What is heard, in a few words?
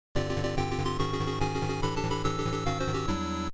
Tron cabinet advance level; arcade